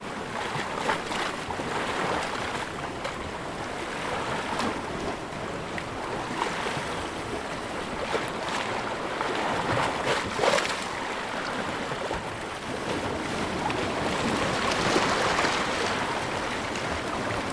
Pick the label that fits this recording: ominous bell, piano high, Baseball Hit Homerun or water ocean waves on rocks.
water ocean waves on rocks